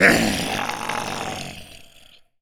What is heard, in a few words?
pain, Zombies; zps; Z Cop